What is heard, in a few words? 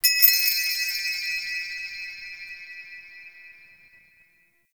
Interior Orb Station Chime; Warframe; Tau; Orbital Station